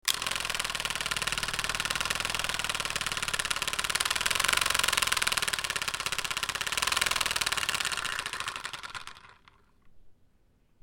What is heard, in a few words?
wind up pedalling water turtle toy